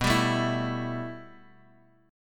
B 7th Suspended 4th Sharp 5th B down Guitar Drop D